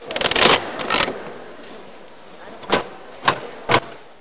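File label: slot machine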